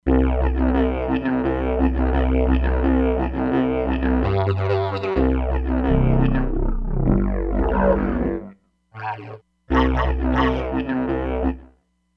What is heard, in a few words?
Didjeridu, E-mu Proteus-3; Factory patches